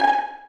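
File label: Violin Pizzicato G#4; Digital Sound Factory Virtuoso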